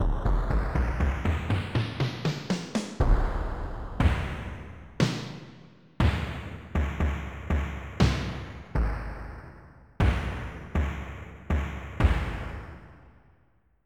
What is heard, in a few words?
Timpani - Snare; FF7